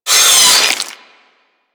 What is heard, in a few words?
Flesh Impact, Weapons; Warframe; Tenno; Miter; Hit Effects